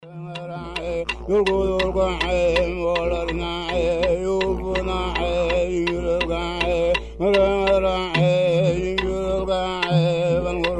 trad aboriginal songs

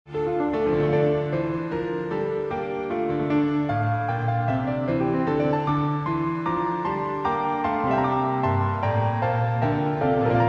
Lamentings on piano

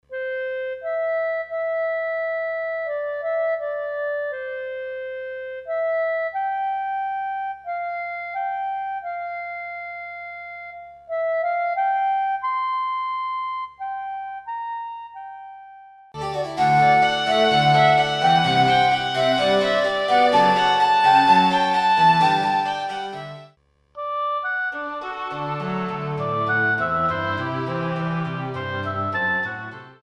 quintet

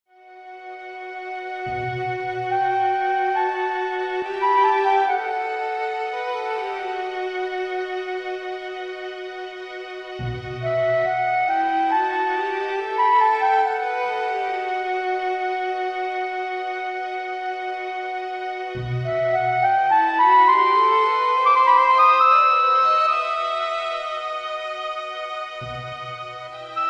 clarinet

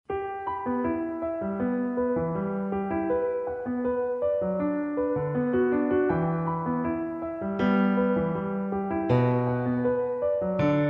Nostalgia pf trio

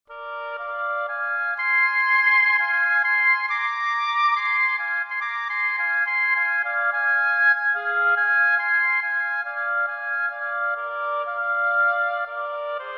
Sylvan duo 2 oboes

